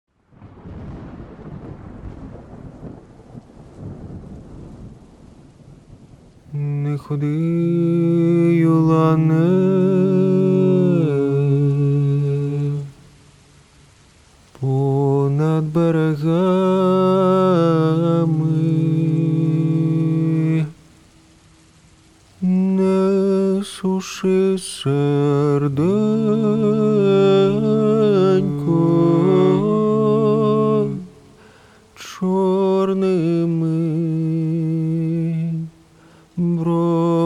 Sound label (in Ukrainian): Народна пісня; Не ходи Улане